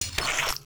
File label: Knife